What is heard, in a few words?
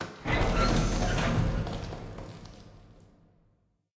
Distant gears; Level3